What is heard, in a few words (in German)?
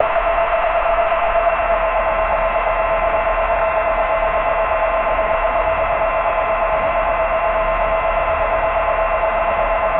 realistisches starten ein, locomotive